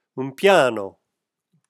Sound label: piano